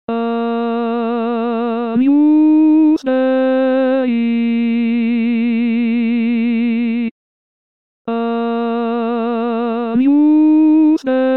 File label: Agnus Dei Tenors; La Messe O magnum Mysteriumde Tomas Luis de Victoria